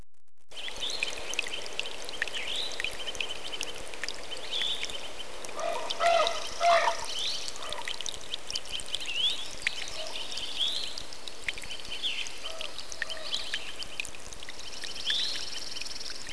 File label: Trickle In Forest